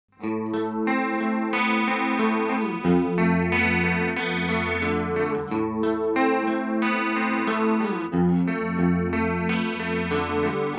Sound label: EHX Pico Pitch Fork Detune